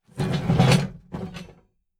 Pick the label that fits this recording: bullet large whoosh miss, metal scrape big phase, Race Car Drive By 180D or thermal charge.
metal scrape big phase